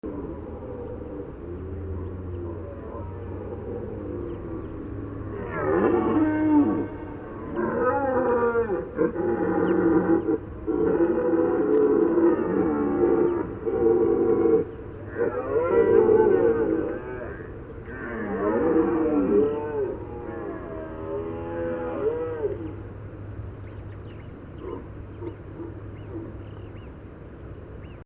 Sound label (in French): Brame